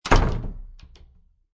Door Close Wooden Door, Household, Door Close, Wooden Door; Miscellaneous Door; Miscellaneous Doors